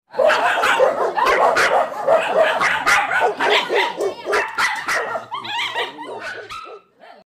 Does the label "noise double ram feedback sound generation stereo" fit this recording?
No